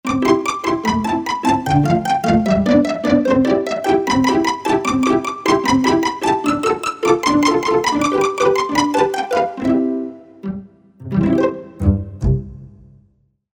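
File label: Pizz Ensemble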